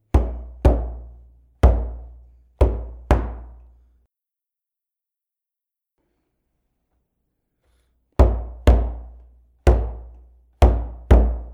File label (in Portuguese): CAMISÃO PEQUENO Toque solto